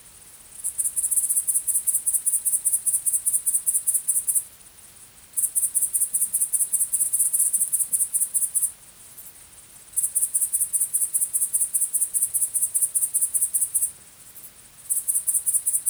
Canyon Grasshopper Loop

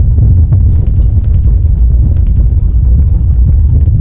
Ball Loop

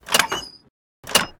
Lever Door

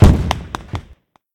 Fireworks Explode; moul

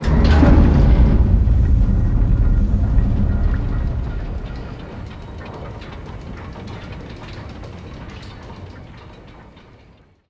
Floor Raise Warn

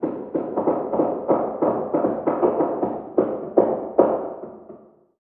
Random Double Hammers; moul

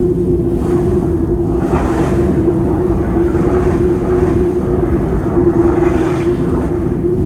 Steam Pipe; moul-assets

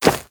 Grass Run

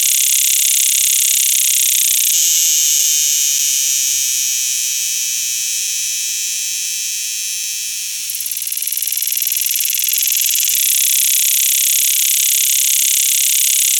Desert Cicada Loop

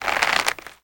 Turn

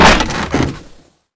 Fumerol Cap Blow

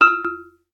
Correct

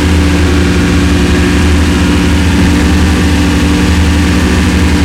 engine loop; moul

